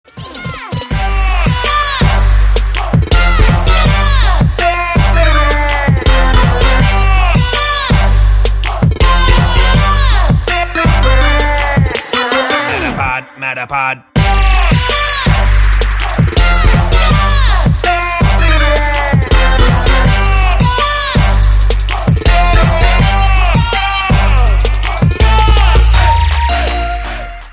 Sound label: Trap Remix; Pokemon Go